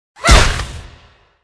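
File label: night guardian attack, monster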